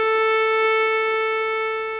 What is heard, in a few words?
violin middle a; art of discovery